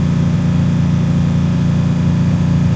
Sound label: Truck Explorer Loop; Trucks